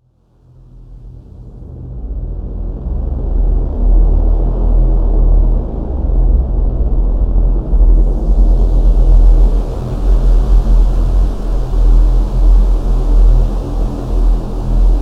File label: Maximum Dread